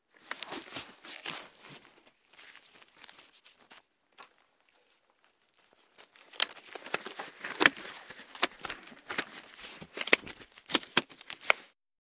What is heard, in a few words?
Rubber Gloves On Off